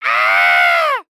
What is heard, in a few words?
Human Male Yell